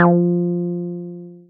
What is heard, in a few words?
Synths BASS